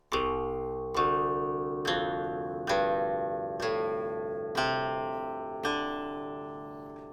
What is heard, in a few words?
Guqin Open Strings